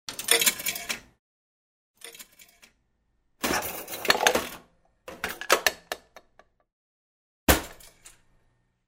Throwing coins into the machine, falling out of goods